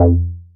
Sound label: Synths BASS